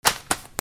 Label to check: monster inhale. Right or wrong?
Wrong